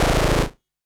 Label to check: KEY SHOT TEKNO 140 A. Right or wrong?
Right